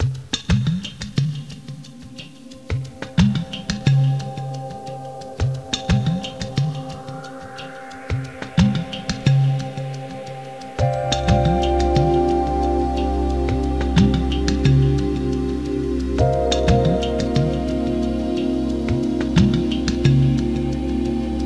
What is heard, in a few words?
Ice Style; 2:20 min.; RCT